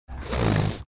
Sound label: making snorting sound